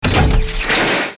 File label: magnetism pickup